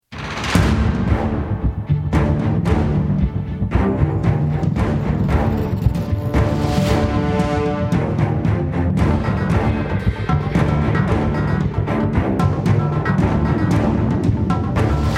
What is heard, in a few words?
start round